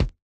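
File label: Bass Drum Break; Yamaha DJX-2B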